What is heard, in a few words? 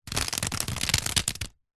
water drips sudden thin plastic wrap; nature